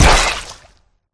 pop; flood

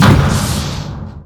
grenadelauncher fire

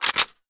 bizon bolt pull; weapons

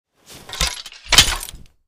warhammer draw

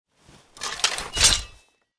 greatsword draw; weapons

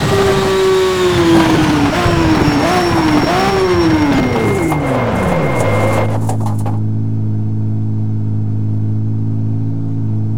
throttle off, vehicles; sgmcars; veneno

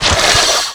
saw flesh, circularsaw hit; weapons

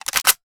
mp5 boltpull

